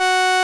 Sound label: SOLINA, String Machines